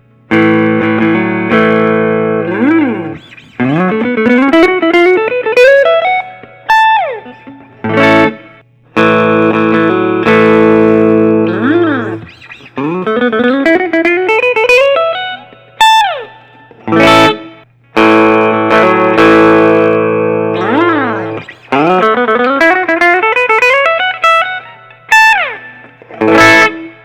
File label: gibson chambered 58 lp glos ice